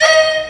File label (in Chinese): 乐器; 潮汕英豪传